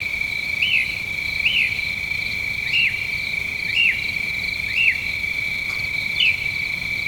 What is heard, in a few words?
night bird loop